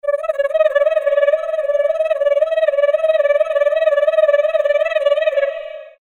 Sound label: space opera